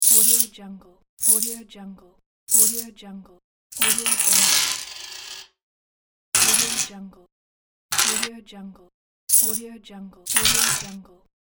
coins grab and drop

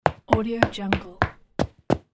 runnin on stairs

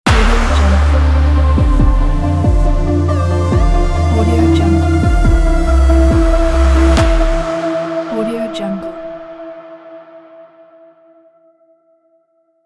technology corporate logo

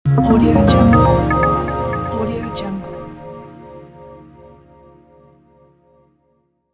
minimal short elegant logo; slavadyadyun

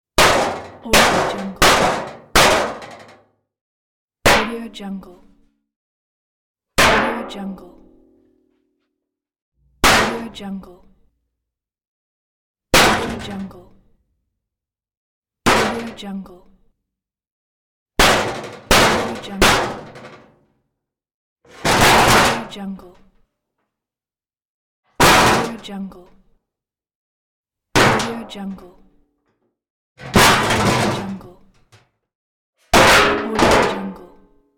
sheet metal hit

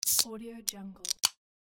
cracking open a can

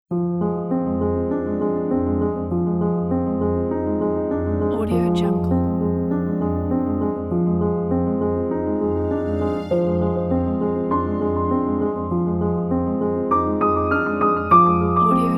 mystery documentary piano

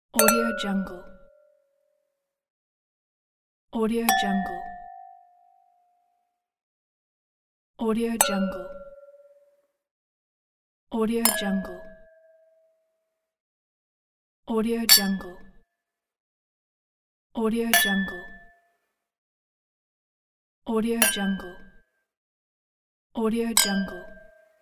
chin chin; teasound

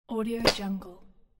farting armpits

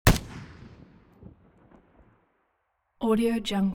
weapons rifle ak47 exterior fire single distant

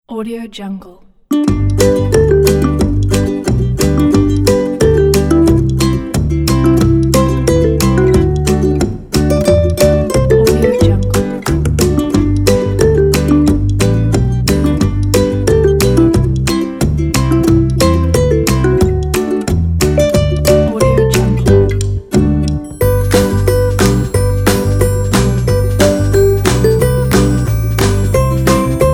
happy jingle bells

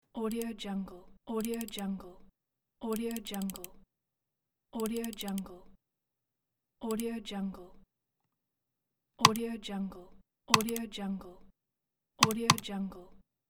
computer mouse

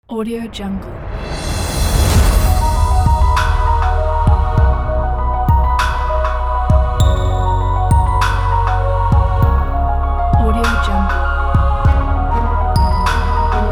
epic cinematic glitch

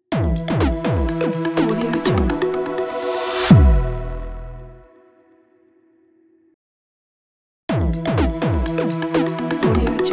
tech logo

